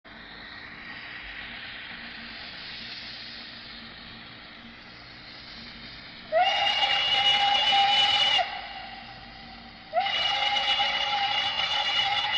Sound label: H-9 whistle, steam; Blissville; Steam Sounds, Steel Rails